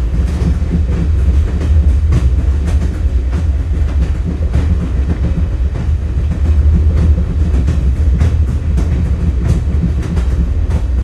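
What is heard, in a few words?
track loop, train; video games; source engine; portal2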